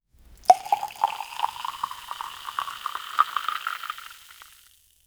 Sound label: wine being poured into a